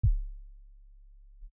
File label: click